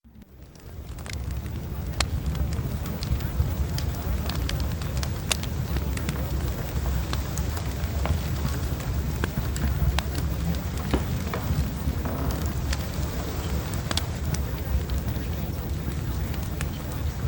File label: Fireplace